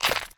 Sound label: step; block; peelgrass block